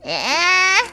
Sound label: polka